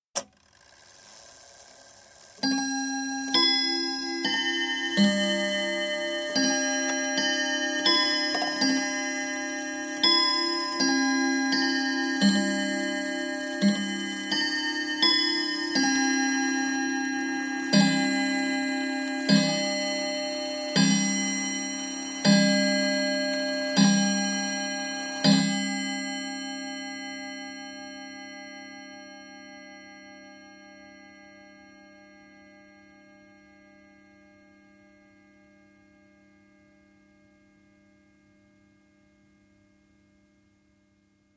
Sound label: Domestic chiming clock striking 6 o'clock; Chime; BBC